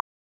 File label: Signal to Noise Ratio; Rohde & Schwarz; UPA; Rohde & Schwarz UPA-CD